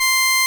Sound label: SOLINA, String Machines